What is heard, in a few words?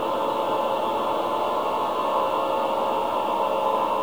choir cluster loop; quake2; scary